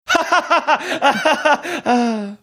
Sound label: forced laughter